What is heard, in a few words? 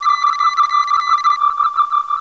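tricorder scan, technology federation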